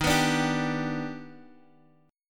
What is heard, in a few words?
Eb Augmented Major 7th Eb, Guitar Open D; 13,14,12,13,x,x; down